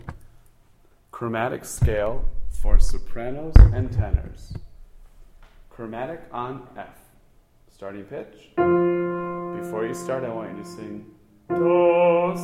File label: chromatic scale soprano and tenor